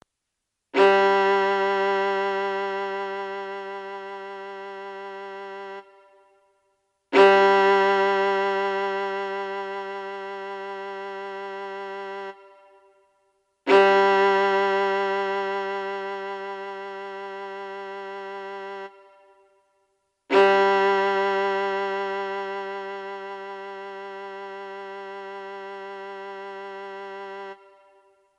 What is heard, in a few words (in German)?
viola g